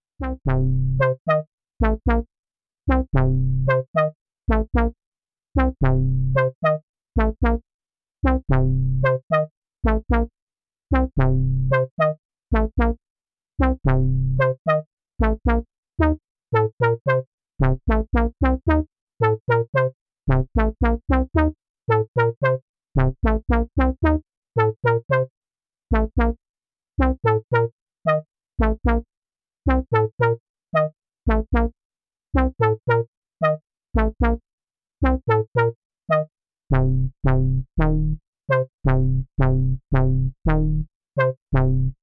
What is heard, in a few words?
Sub Brass